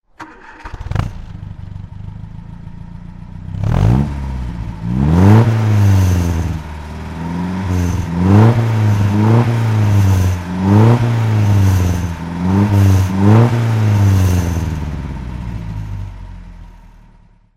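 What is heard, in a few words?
mg mga 1500 roadster throttle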